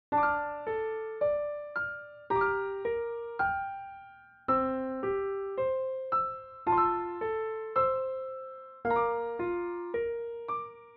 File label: Requiem Music Box